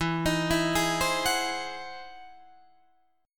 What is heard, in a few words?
E Augmented Major 9th, Guitar, Open D